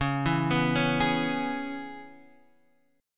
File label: C sharp Minor 7th C sharp Guitar Half Step Down